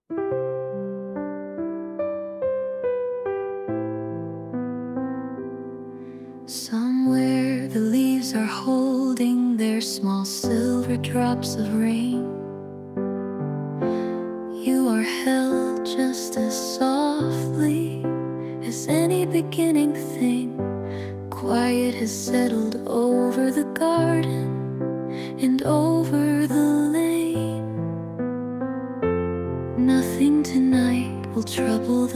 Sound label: lullaby, name songs; barbara